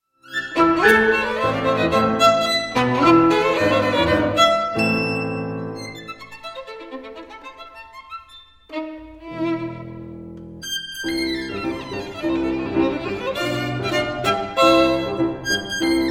Spanish dances op 21 for violin and piano no 2 Habanera; pablo de sarasate